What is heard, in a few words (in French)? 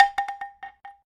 bambou echo